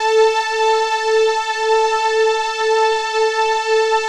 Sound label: Big String, Keys, Pads